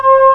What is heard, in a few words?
DETUNE